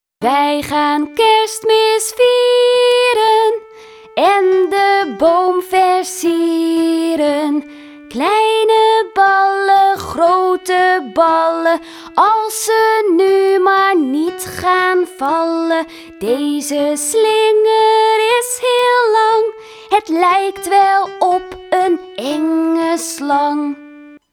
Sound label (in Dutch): de kerstboom zang en pianobegeleiding; leerjaar-1; taalsprong